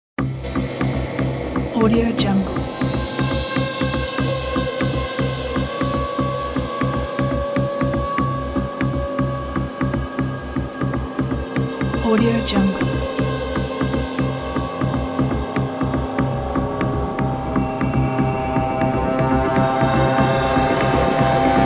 glitch electro industrial hybrid score

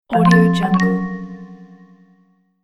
magic approval; sonic-boom

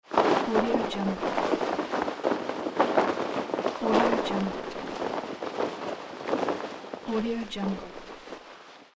tarp tent flapping in wind; aetherfx

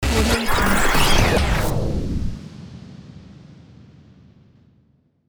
space shrapnel; sound ideas

